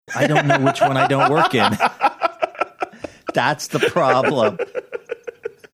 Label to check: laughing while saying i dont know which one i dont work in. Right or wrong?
Right